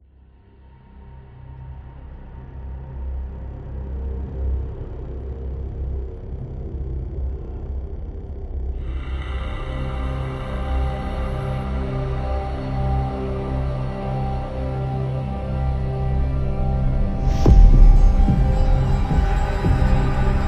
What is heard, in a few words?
Soundscape Ambient; documentary